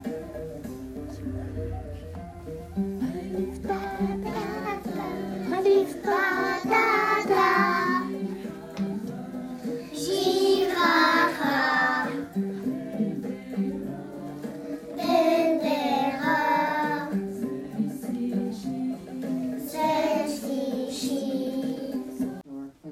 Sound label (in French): chanson alphabet arabe